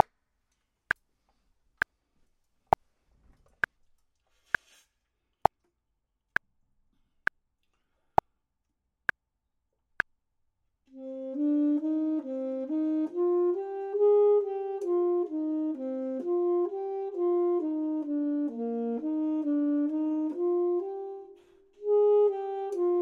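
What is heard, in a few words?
bottom, Saxophone and Clarinet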